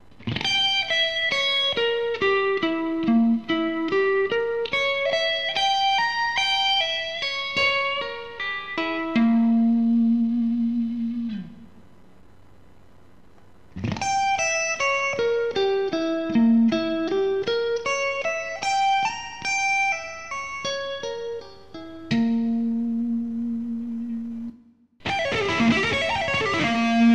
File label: Lick, guitar